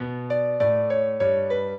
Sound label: minuet, piano; Mozart